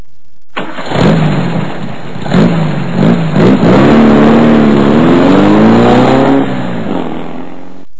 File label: beetle, vw; abroad